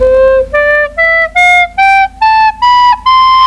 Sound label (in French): Gamme Flute